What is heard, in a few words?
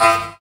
"ah", robot; Voice; ooh ahh